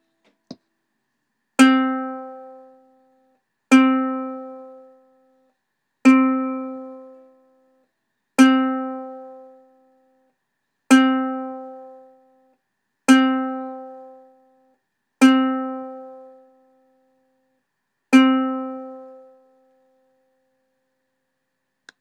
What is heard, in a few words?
c note 2nd string